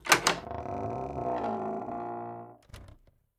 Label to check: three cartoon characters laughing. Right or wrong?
Wrong